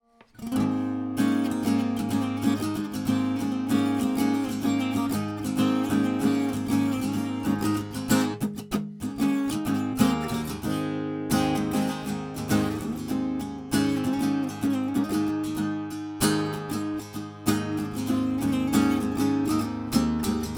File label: AC GTR DI All 3; 97 Percent Fire Studio